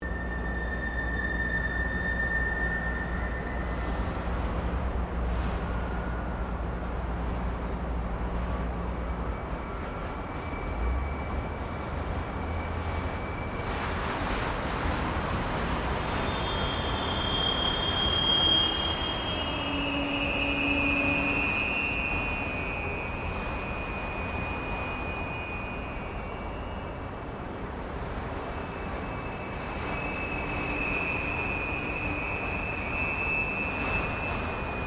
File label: Wind And Resonance In Apartment Building; Weather